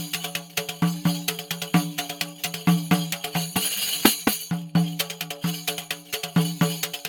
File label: tambourine; gcompris; instruments